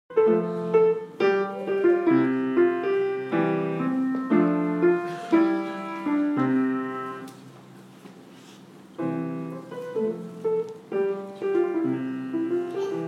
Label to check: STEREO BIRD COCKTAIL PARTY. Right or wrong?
Wrong